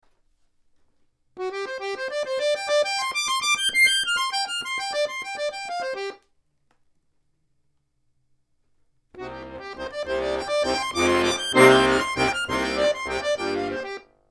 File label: relationships lead bass